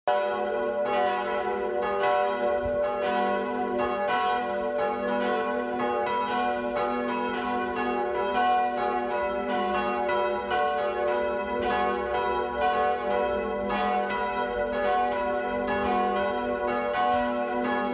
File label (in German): Glocken